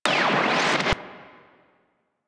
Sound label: Static Out C; DE; Warframe; Night Wave